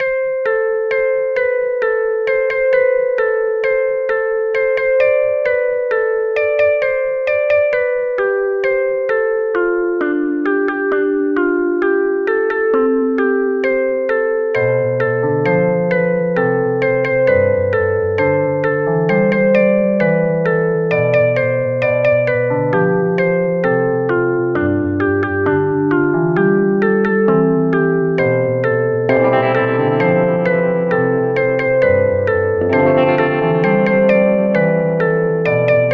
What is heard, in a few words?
Music box; soyCat; Attics and mysteries